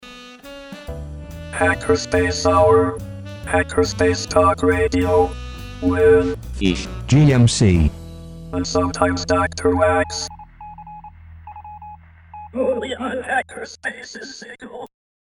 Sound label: jingle; Hackerspace Hour